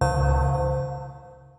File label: gong, Roland MC-909